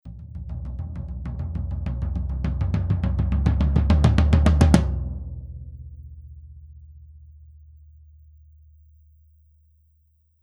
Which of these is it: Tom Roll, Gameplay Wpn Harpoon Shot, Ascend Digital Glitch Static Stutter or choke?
Tom Roll